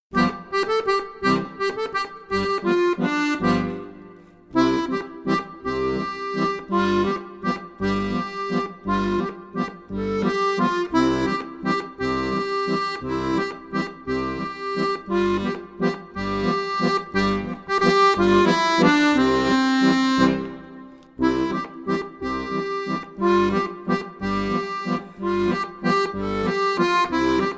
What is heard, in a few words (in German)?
Kleiner Walzer